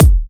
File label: Kicks; Vengeance Samples